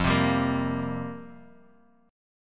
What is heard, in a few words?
F-Suspended 2nd Suspended 4th down Bass Standard, Fsus2sus4 chord; strummed; JGuitar